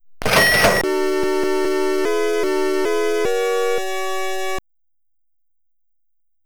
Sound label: Check Fanfare; The Three Stooges; NES